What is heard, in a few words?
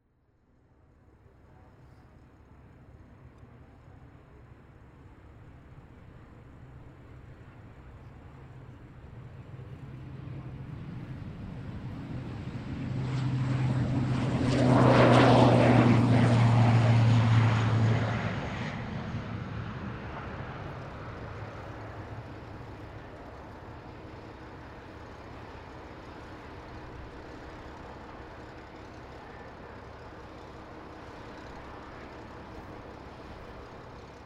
Airplane Boeing 737 plane takes off from the runway